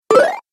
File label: Retro Videogame Fx Jump Spring